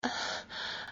Breath; Lewd SFX

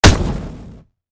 attack impact; Li Li Shadowpaw Sound Effects; Li Li Heroes of the Storm Responses; warcraft

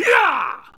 Grunt; Lewd SFX

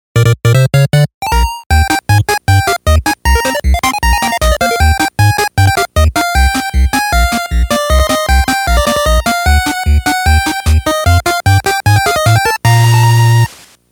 8-Bit

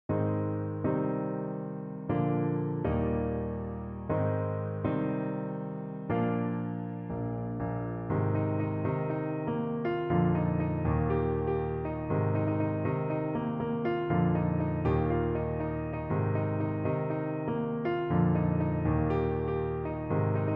Piano

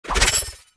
attack projectile; Li Li Shadowpaw Sound Effects; Li Li Heroes of the Storm Responses; warcraft

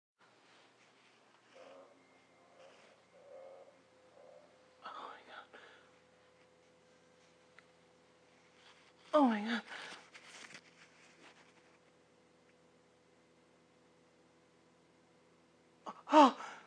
orgasm

